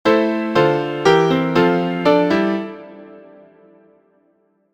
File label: mini bach original chorale